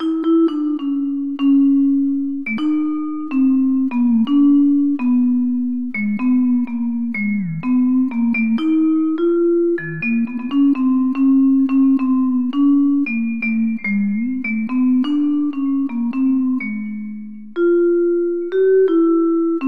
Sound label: drum